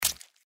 mantis foot; npc

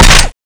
mine shoot; tfa cso; heaven scorcher

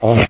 pain; doom3; morgue

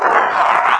chatter combat, revanent; doom3

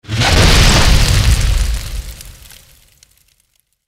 fire gas high; npc

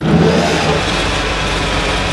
door move

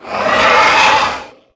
facehugger striking

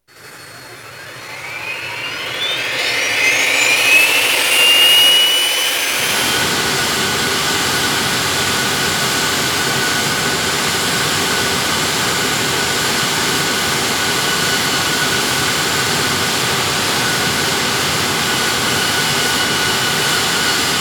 jet start; jetvehicle

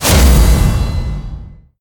whoosh metal; gundyr

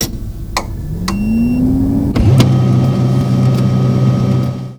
startup

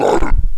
pain, zombies

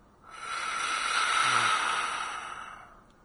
breath throaty; doom3

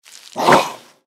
feral ghoul attack; npc

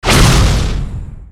weapon swing

